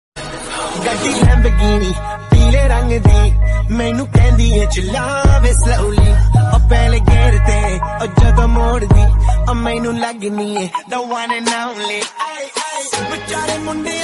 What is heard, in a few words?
song DJ song